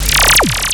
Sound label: scifi, SCIFI & SWEEPS; Techno Trance Essentials